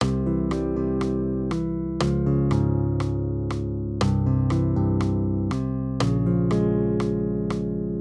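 Accompaniment, Major Triads, C-Db, 120 BPM, guitar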